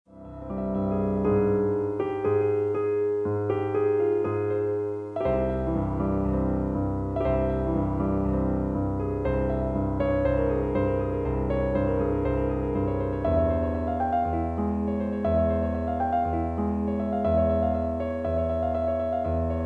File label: Piano